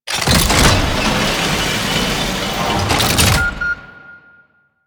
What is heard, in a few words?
Alchemy Transmuter Hit React; Warframe; Entrati